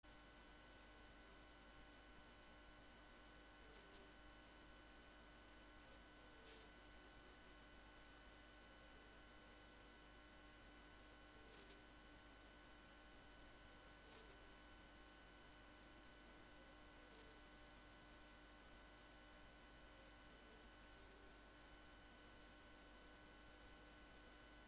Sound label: Jingle Bells